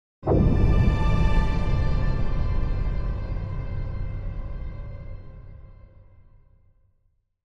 Hit Distant Blast Orchestral Symphonic; LYN115 Trailer Production Elements

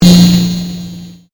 Convolution Pulse

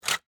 Shutters Open, Window, Slide; Household; Window Sound Effects; Windows (Sound Effects:Household); BlastWave

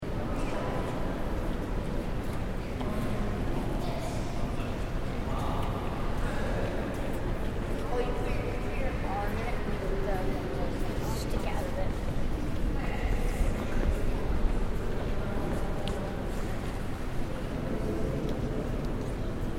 Museum Ambience Distant Crowd Roomy; Museum Ambience, New York Metropolitan; Building Sound Effects; Lynne Music

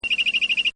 BIRD; Animals; Partners In Rhyme

